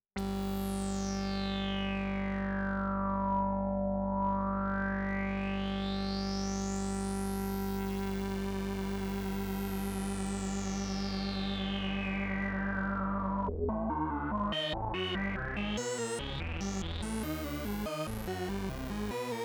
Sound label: synth triangle waterfall